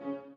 b piano

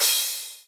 soft hit finish; osu

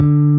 bass

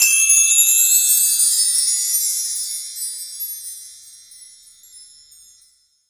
soft hit whistle

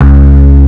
bass; osu

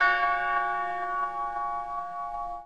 BELL

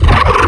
Growl

